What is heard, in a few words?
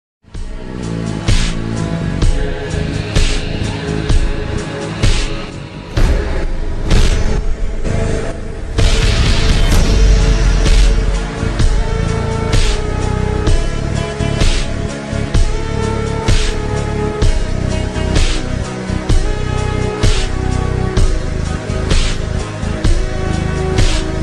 instrumental slowed reverb